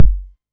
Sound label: 808 Kick